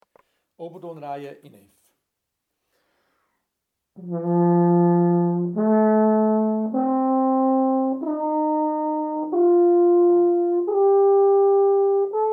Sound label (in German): Alphorn